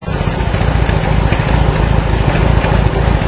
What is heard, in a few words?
MGB 1800+ 2 SU HS4